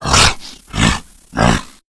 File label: tank fail, voice, growl; Left 4 Dead 2